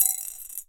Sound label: Drop In Glass, Medium Wine Glass